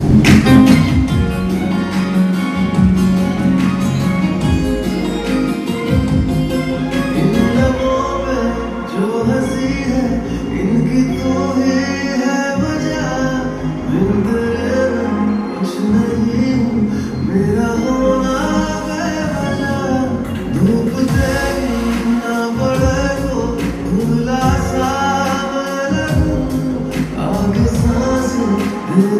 Inde musique de film

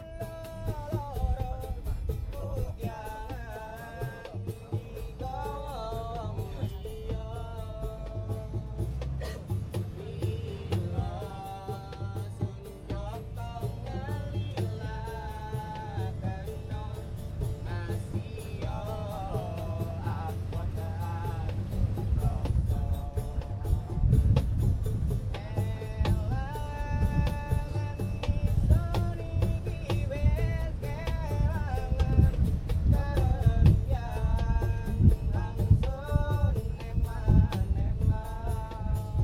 Indonésie musique à la radio en voiture vers Sempol

